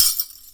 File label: Cheap Sleigh Bells; super rock blasters